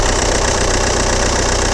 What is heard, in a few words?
golf, Vehicles